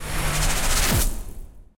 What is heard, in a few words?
mega Win Ramp Up; arcane